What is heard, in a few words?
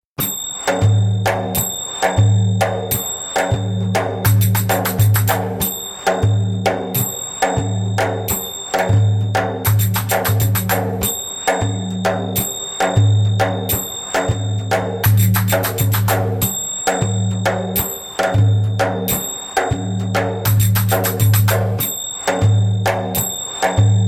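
Aksak arsilamas fast spoken, djembe; Djembolale - Grooves and Moves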